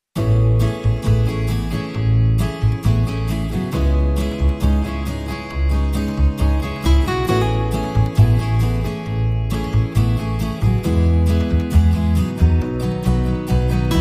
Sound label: Chilling Out No Perc